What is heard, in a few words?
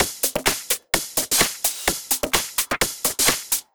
Loop, 128 BPM